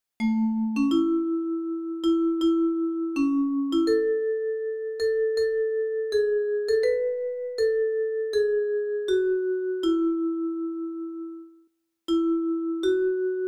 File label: vibraphone